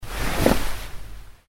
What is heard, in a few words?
stand, dice